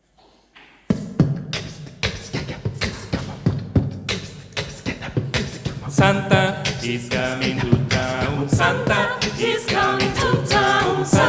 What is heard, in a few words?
Coro Vocal; villancicos